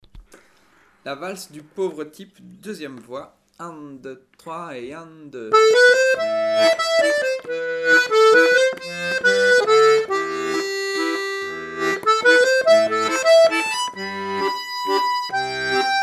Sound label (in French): valse voix2; ateliers diato 2